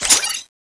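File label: void pistol scanning on, weapons; cstrike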